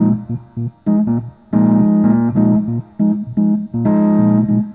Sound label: ORGAN